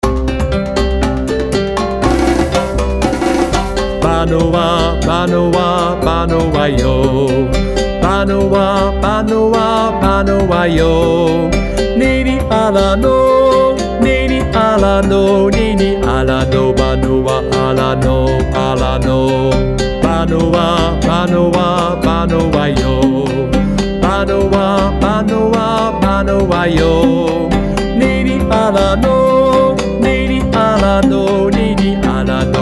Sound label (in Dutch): Zang